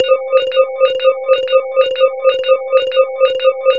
sequence loop; Zero-G Ambient 1

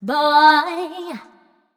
f mel f, fem Vocals

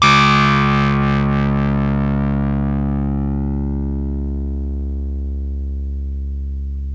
rez rhodes; PlatinumPhattCD2; E-MU Producer Series Vol. 8 – Platinum Phatt

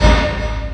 XENON FX; USB Soundscan vol.24; AKAI; Industrial Loops

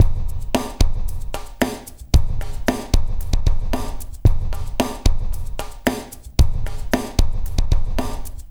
LQT AMB, Liquid Grooves; Spectrasonics; 113 LIQUITEK

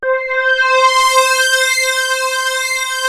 POLY PAD C5, Moog; USB Soundscan vol.49; Analog Fantasies; AKAI

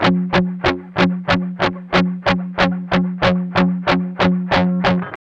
MED ROCK 8TH G 2, Guitars; Spectrasonics; Hans Zimmer Guitars VOL-1; 102-MED ROCK

